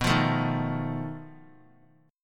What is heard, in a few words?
B 7th Flat 5th B, chord; B7b5 strummed; JGuitar